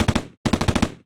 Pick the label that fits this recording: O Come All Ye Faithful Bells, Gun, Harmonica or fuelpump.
Gun